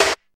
sizzle acoustic snare d sharp key, Royality free snare tuned to the D# note; snare drum samples